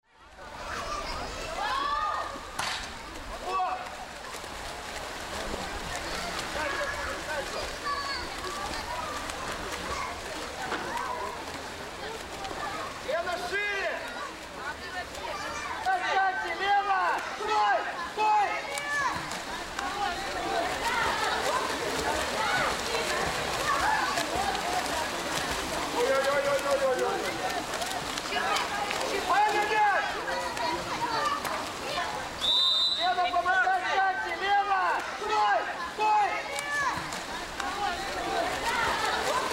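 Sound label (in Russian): водное поло с криками тренера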